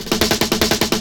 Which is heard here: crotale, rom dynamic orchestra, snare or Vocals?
snare